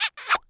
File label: Cartoon Kiss Short Squeaky Cartoon Kiss; AdobeCC